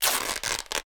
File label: Cotton Rips, Torn T-Shirt; InMotionAudio